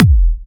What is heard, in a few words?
Electro Kicks